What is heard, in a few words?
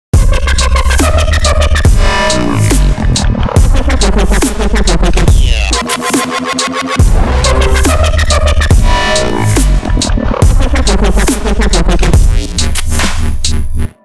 wobble